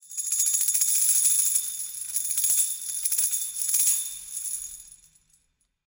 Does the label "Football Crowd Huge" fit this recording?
No